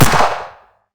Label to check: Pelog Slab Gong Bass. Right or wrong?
Wrong